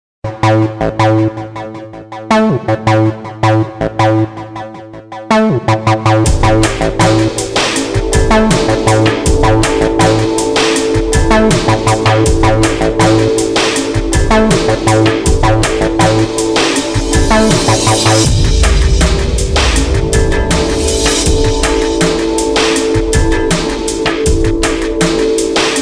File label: Modern Chillers Win; After the End The Harvest